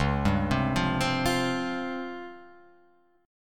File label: Db Major 7th Flat 5th; x,4,5,5,5,7,7,x; DbM7b5 arpeggiated; JGuitar